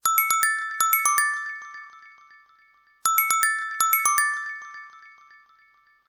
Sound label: Crystal, ringtones